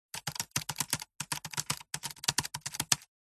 technology computer keyboard typing sequence; zapsplat

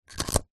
food ice cream cone slide stack into another; zapsplat

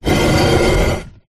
household ceramic fruit bowl movement on table

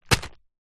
household wet wipes packet drop on carpet; zapsplat

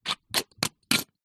bottle lid twists open or close fast

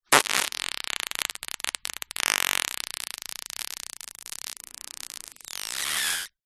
human air suck through lips

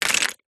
household makeup pots handful drop onto others

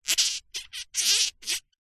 plastic small strap movement squeak creak; foley; zapsplat

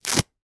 household plaster remove from skin

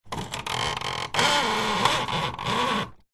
household blind venetian cord pull close up creak

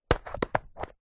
leisure game travel plastic box unclip open; cluedo; zapsplat

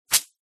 pine tree leaf dry whack hit surface; foley

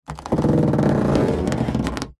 balloon inflated rub movement creak; foley

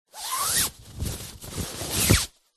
foley flag handling movement pull through hand; zapsplat

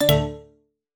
app alert tone remove delete

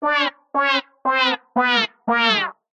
cartoon fail brassy synth wah; zapsplat

